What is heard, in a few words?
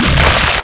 melee, monsters fiend; svencoop; quake1